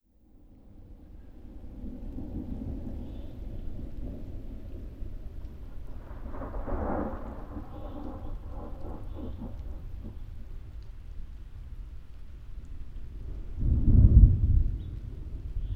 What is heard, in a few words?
Summer Thunderstorm